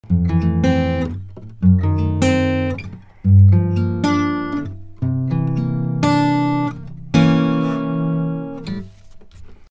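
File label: u87 guitar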